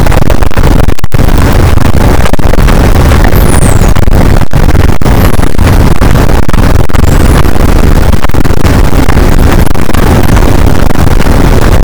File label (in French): cachalot negatif, boat, Physeter Macrocephalus